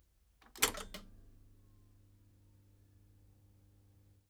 Studer A80 power switch on mono, Master recorder analog tape machine; Ivo Vicic